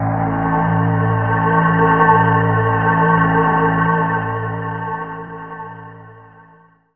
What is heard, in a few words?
DR. LOOP, TRANCE; X-Static Goldmine 3